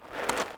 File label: footscrape; Rust